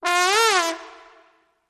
Trombone Medium High Gliss Up and Down

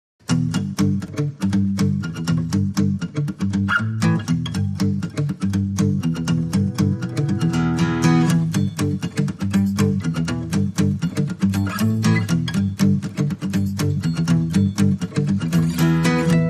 Guitars Only

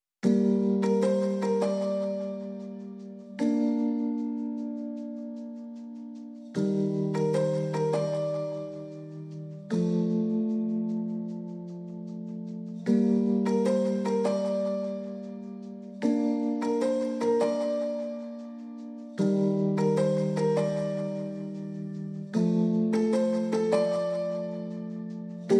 Piano Only

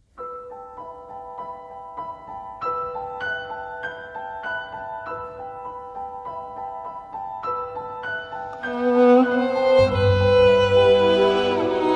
Melody With Violin

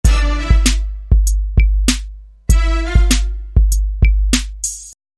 Hardcore

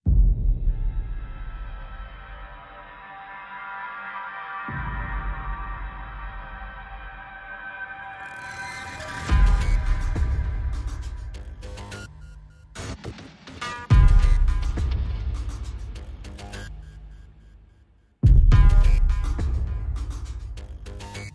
Perc and Pulses Only

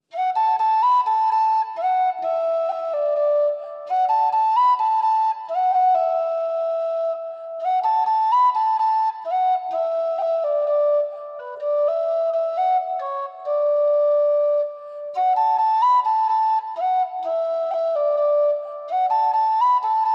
Solo Tin Whistle

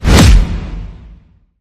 Sonic Boom Pow

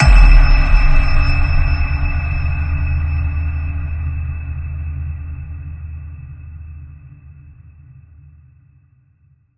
High Ping Energy, E

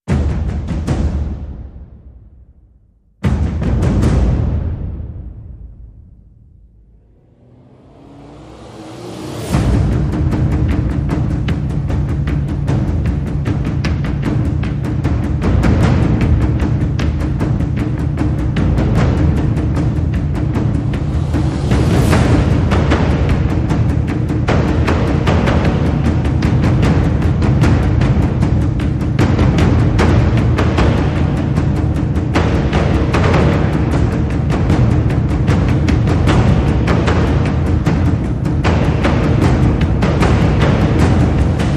Percussion Only

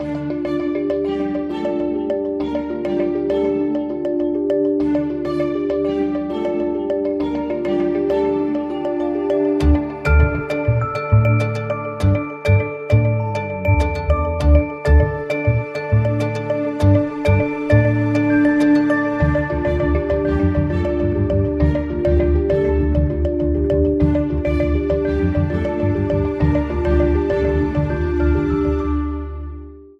Underscore

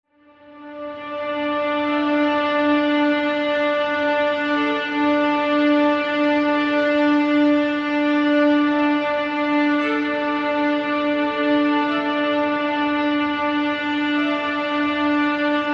Hushed Strings Layer

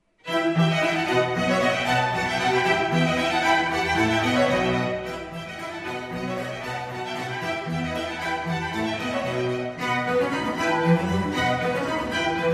Concerto in E minor for 4 violins strings & bc; RV550; Antonio Vivaldi

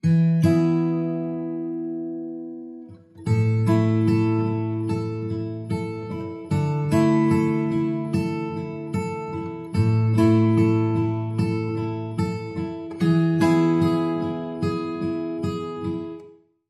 Bright Sunny Afternoon, No Drums

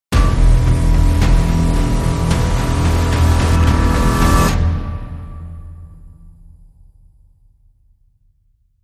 Crime Sting

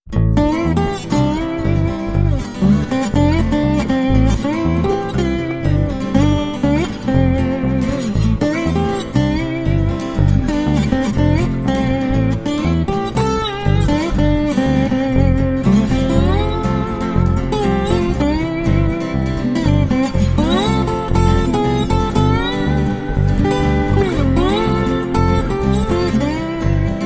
Happy, No Drums

